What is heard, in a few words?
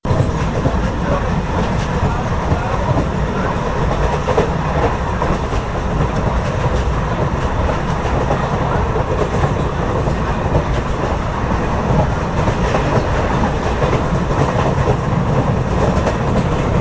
running train; cstrike; kz ins trainrun